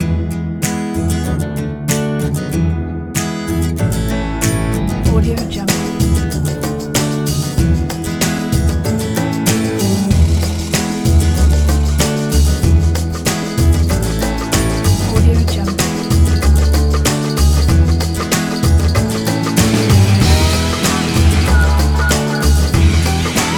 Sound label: cool tv show theme; metromusic